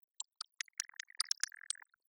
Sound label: denoising glock 20dB